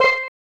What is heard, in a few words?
Tadpole Melody (Do); super mario rpg 1996 snes